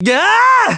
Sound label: pain; goku